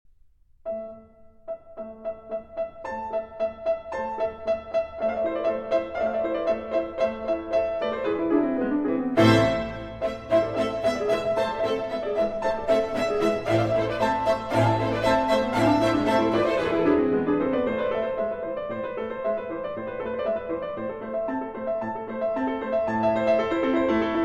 Keyboard Concerto For Four Pianos in A Minor, Allegro; Bach, JS